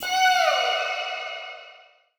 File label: Chloropixie Death